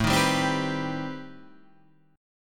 C Minor Major 7th A

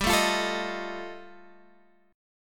G 7th Suspended 2nd Sharp 5th G chord

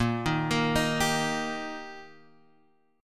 D sharp Major, A sharp

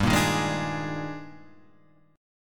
D sharp Major 11th, G, guitar chord

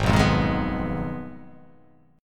G sharp Minor 6th; 4,2,3,1,3,3,1,x

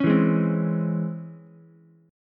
C sharp Minor Major 7th C sharp Ukulele; C#mM7 strummed; 6,4,4,3; JGuitar

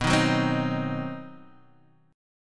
C Diminished B, chord; strummed; JGuitar

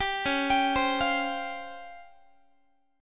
Db Major 7th Flat 5th, Guitar; arpeggiated

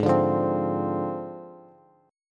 Ab 6th Flat 5th Ab, Bass; strummed; JGuitar

